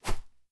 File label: arrow fly